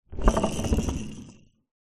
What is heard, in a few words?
household pepper mill wooden large roll on table